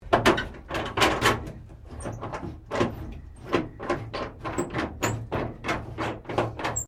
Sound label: Train Diesel TGR X Class 1951 Tasmanian Cabin Opening Access Panel; Bellarine Tourist railway vehicles